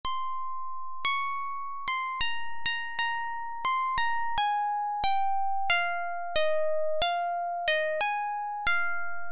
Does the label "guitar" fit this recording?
Yes